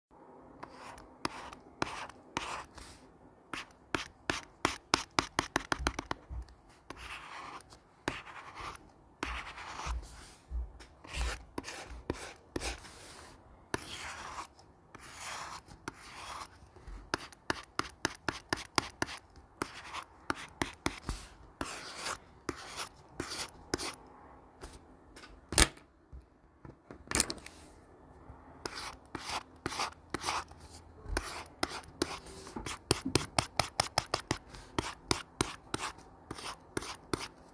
Writing & drawing on tablet

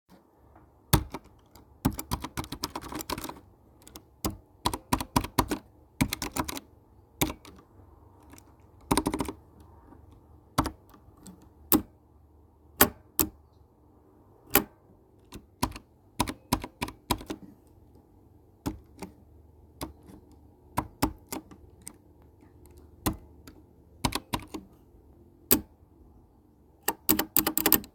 Pressing keyboard

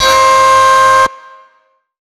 espectral brazilian funk dissonant stab 174bpm C

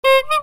honk

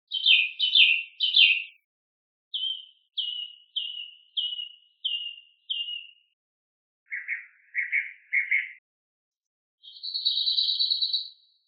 bird chirps

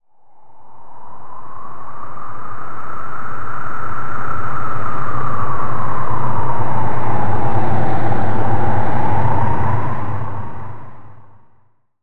scary graveyard wind; mixkit

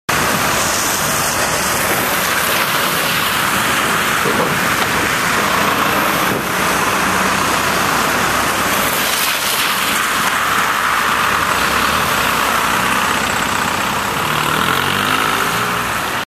driving on melting snow

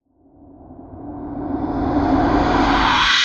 Swooshy Approach

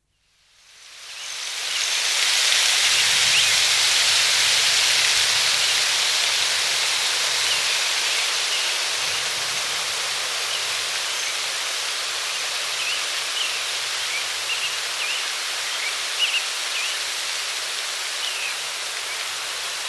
wind atmos; bbc

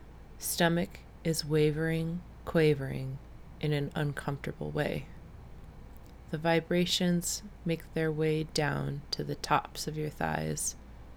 STOMACH

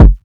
jersey club dark kick

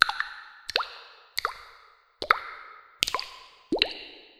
drips 94bpm G minor

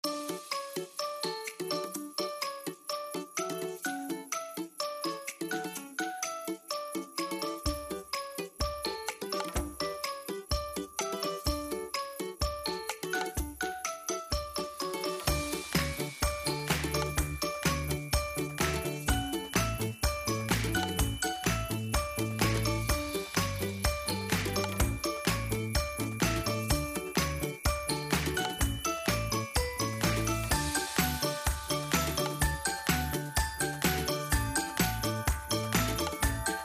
bg music

